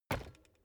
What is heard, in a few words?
bicycle street foot pedal